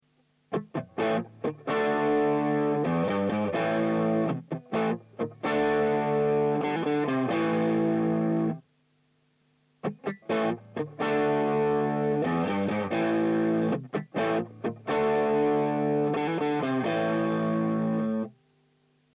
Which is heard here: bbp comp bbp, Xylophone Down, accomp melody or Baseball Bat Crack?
bbp comp bbp